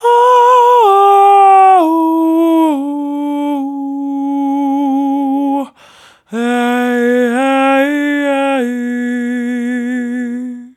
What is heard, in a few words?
voice owls; Loops; Sad